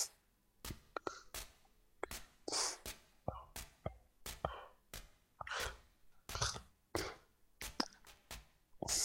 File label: Television; MiSide